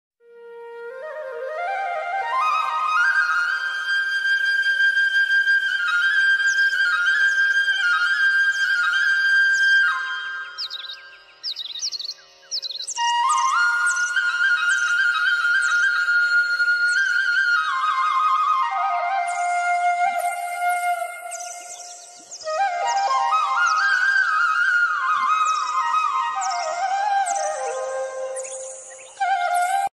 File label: flute music ringtone, himalayan flute music, morning flute ringtone